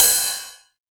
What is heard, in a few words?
909 Ride, Drum Machines; Novation DrumstationV1